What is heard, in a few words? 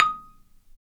Marimba; Roland LCDP11; Africa VOL-1; Af.Marimbas